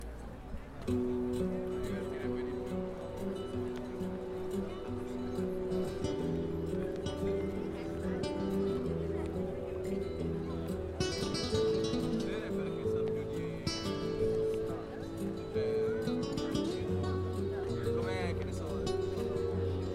Barcelona Guitar Outside Cathedral, street musicians; Busker